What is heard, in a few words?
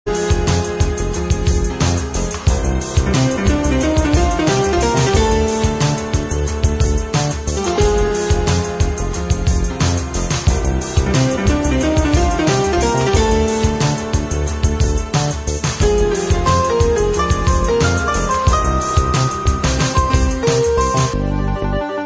Piano Lead